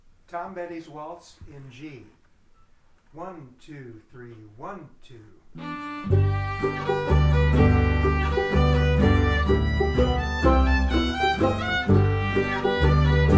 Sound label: Waltz, Stringband; Tom Bhetty's Waltz